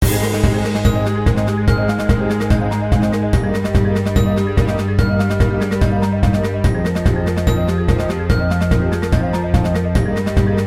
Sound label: grass battle normal; world grass